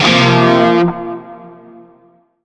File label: Shawzin Hirajoshi Chord I; Music; Warframe; Narmer